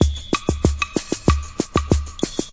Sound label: 123 bpm fast bossa tiny snares